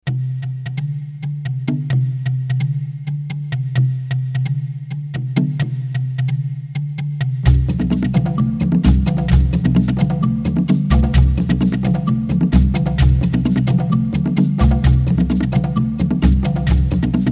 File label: Tribal Percussion